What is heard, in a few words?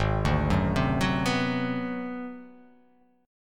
G sharp 7th Sharp 9th Flat 5th; G#7#9b5; arpeggiated; 4,5,4,5,6,6,x,x; JGuitar